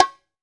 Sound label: GM PERCUSSION KIT; AKAI S6000 CD-ROM - Volume 5